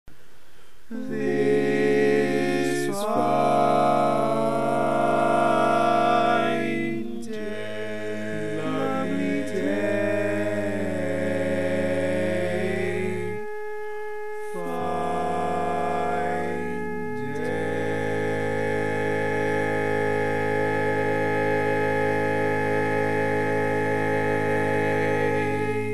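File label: Baritone, barbershop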